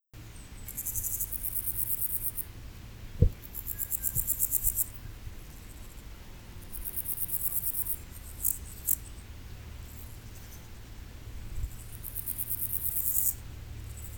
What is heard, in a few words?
C parallelus, Pseudochorthippus parallelus